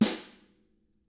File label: DW BR, Drums; ILIO; Double Platinum Drums 1